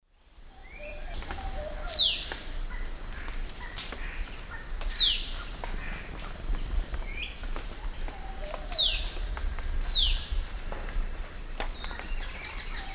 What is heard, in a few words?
Lanio fulvus, call; S. America; Eastern Lowlands